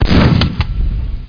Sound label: gun cock